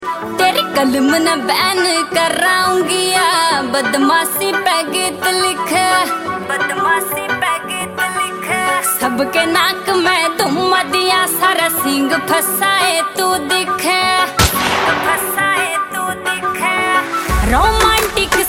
Haryanvi Song Tone

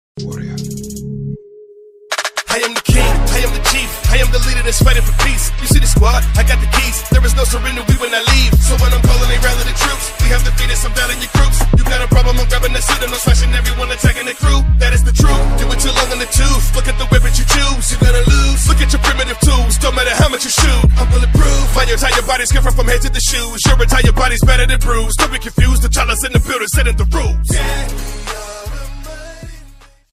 Rap Mobile Ring; Black Panther

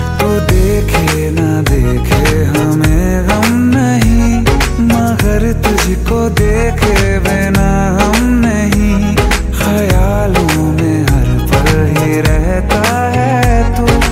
Song

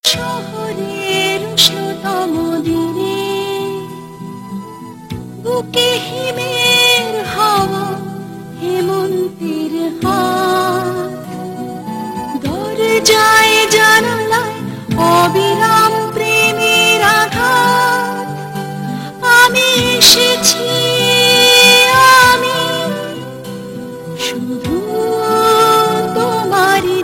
Bengali Ringtone

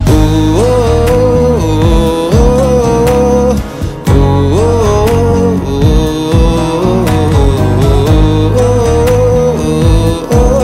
Humming; Radhe Shyam Movie

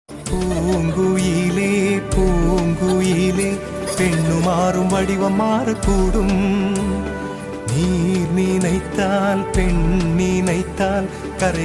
Rhythm; Ringtone

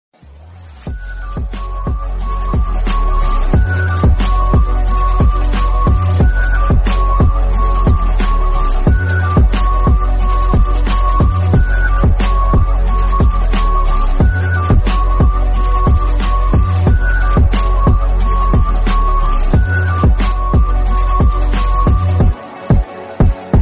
Bgm; Free Fire

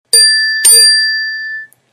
glass sms tone; iphone

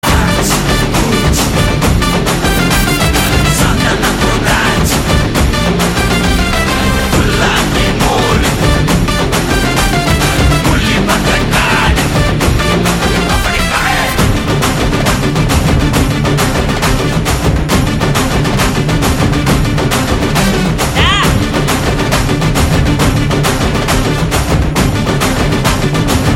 Kabaddi BGM; Vaathi

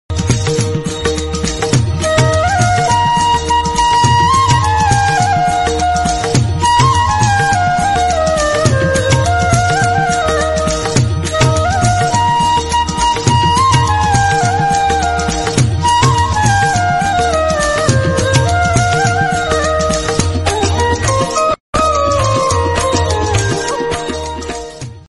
Instrumental Hanuman Chalisa Ringtone

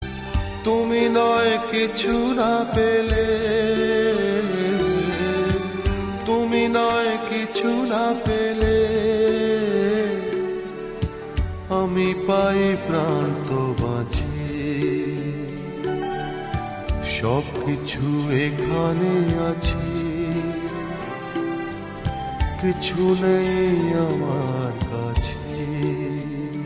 Bangla Sad Songs Ringtone

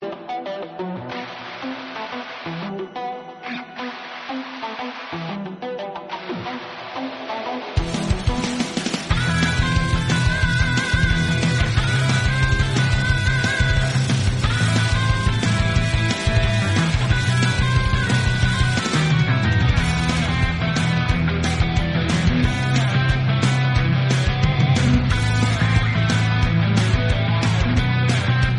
theme song; Free fire booyah day

